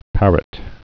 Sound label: parrot